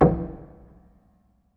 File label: Ped Noise Etc Sgl Knock, Piano; Piano Miscellaneous